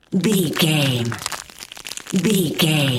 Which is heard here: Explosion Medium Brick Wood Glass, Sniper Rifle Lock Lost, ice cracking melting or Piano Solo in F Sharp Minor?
ice cracking melting